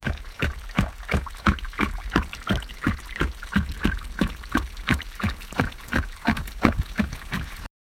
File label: donkey running on water; activities